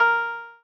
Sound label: Koto Bb5